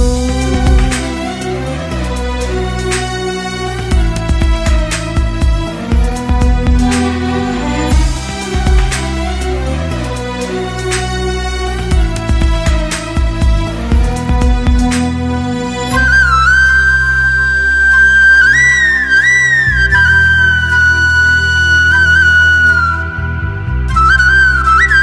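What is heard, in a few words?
background Score Telugu Ringtone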